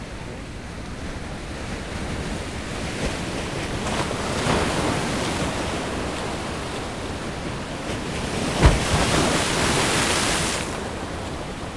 kicsapódó hullámok

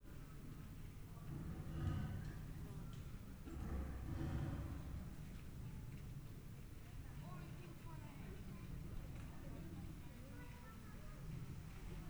kifutó tigrisház, bengáli tigris; miskolci zoo 2009

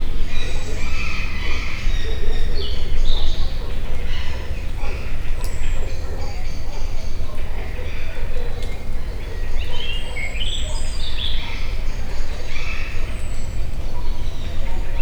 tavolbol tigrishazbol uvoltes, sziberiai tigris; állathangok; miskolci zoo 2018

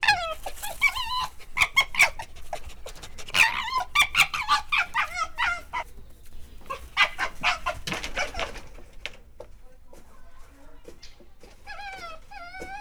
ember iránt érzett öröme, vörös róka; állathangok; miskolci zoo 2009

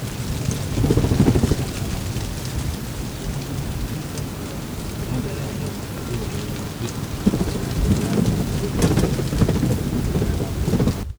barlang intenzív beszéd, denevérek; repules; termeszetben

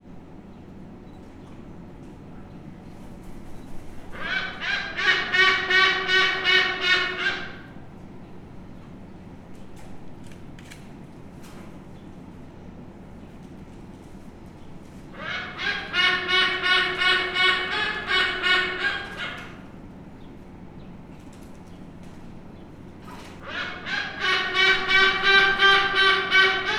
trombitas szarvcsoru torpe flamingo; debreceni zoo 2012

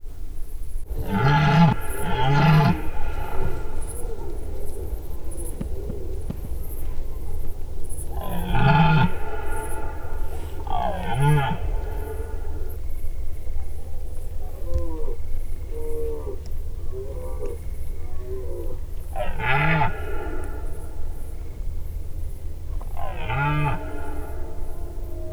erdőszélen, gímszarvas; termeszetben

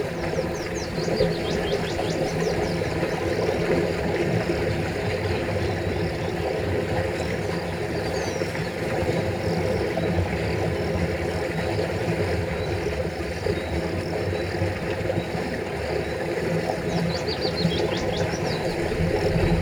kisvasut menti vizes arok leeresztese, zsilip, hortobagyi halastavak

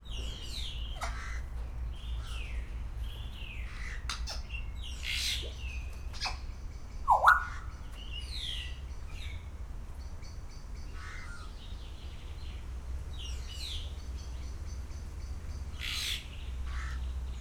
jákó szünetekkel megszólal, jákópapagáj; szegedi zoo 2011

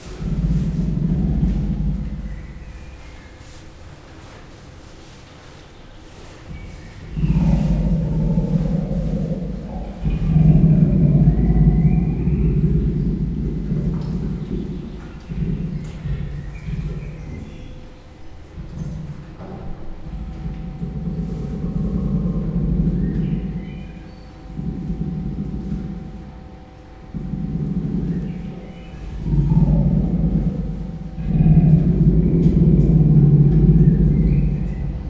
mennydörgés szélvédőszivacs nélkül, perzsa leopárd; pecsi zoo 2017

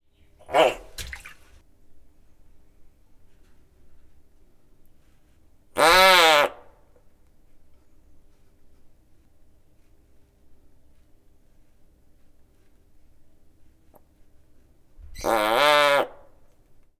ökörbéka; miskolci zoo 2009

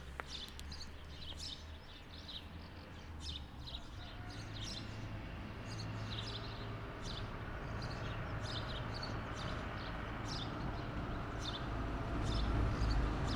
verebektől hangos utca jobbról teherautó; Szőlősardó

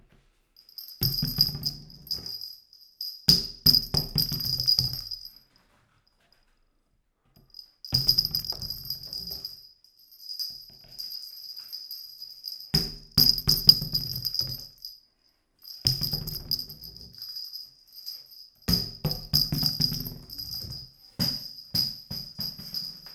hosszasan ide oda a vegen csak leesik, csorgolabda jatek asztalon guritas; Perkupa

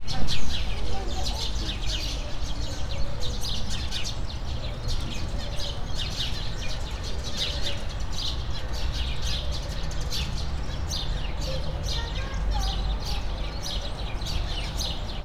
általános hangulat, házi veréb; debreceni zoo 2012

